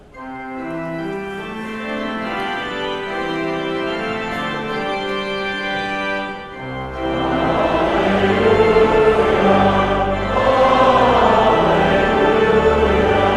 Gospel Acclamation

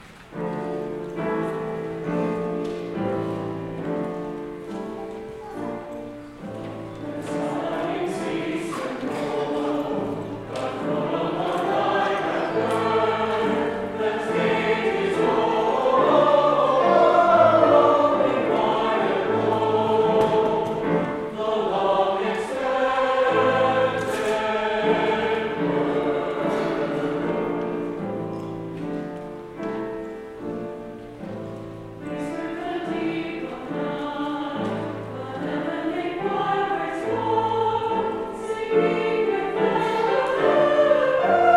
Choral

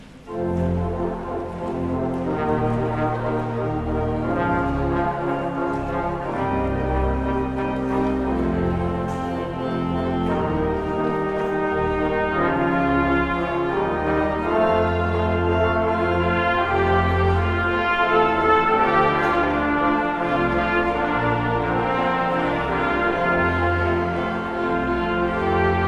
Postlude; Beach Spring

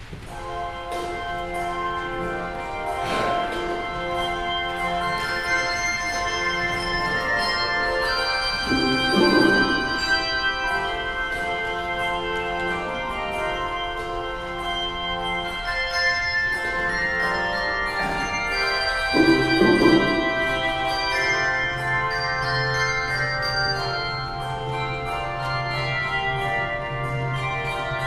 Handbells; God of Grace

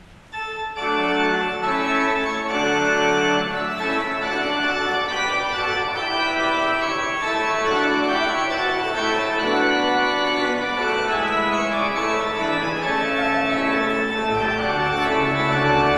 Postlude

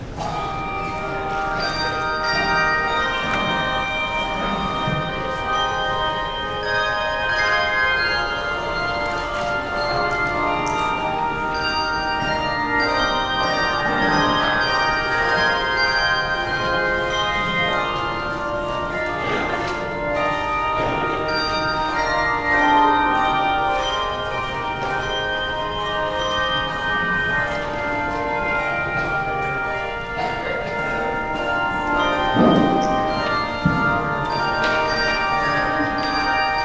Handbells; Lo He Comes with Clouds Descending

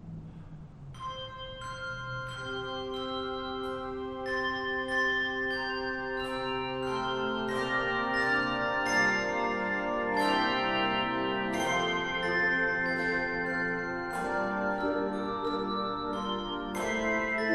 Handbell rehearsal; Come to Calvary's Holy Mountain

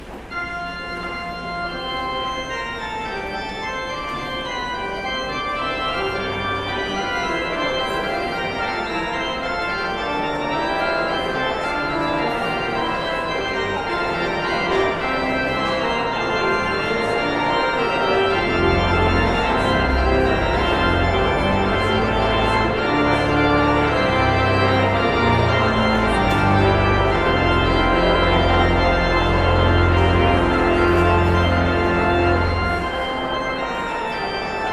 Postlude

